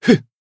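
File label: Voice attack, Japanese; shion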